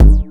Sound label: grind, EMU XL-7